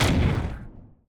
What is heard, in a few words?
artillery sap; Mindustry